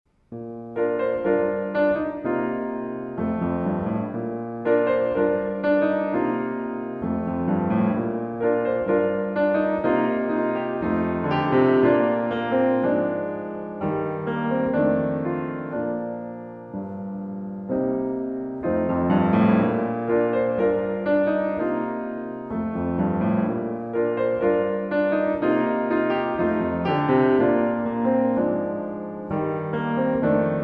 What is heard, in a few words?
accompaniment; snow white